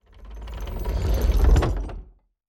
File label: turnstile stop; fallguys